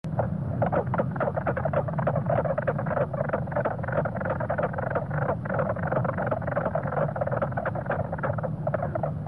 frog; mink frog

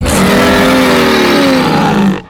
roar; lagombi

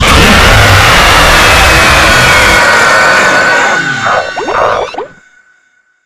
faster jumpscare

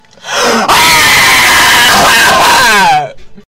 russian wolf shriek

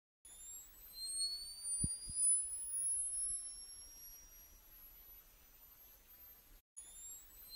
charge flash shutter

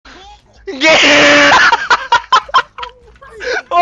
wheeze

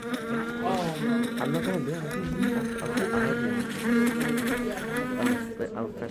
fly buzzing

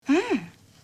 mmmm

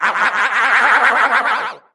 owowowowowowowow; smm wario

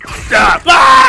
weird scream

